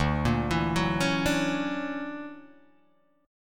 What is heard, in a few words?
D Augmented Major 9th, Guitar, Open D